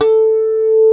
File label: Gui Hrm Flan A3, Gigapack GUIT2 AC; Best Service Gigapack 2